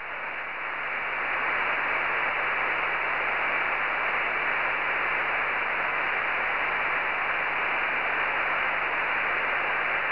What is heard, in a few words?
FSK441; YO2NAA; HA7UL